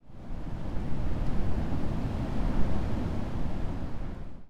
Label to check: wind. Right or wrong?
Right